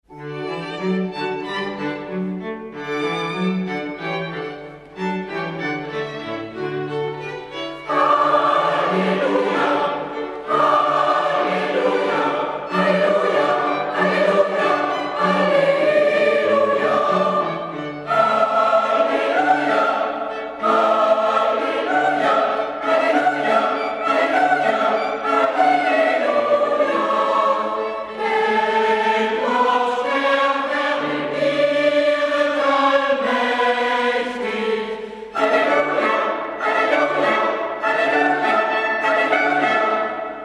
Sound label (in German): Halleluja; Haendel